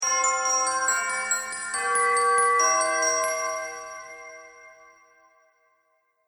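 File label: Xmas Transition Tubular Bells And Glockenspiel; Musical Sound Effects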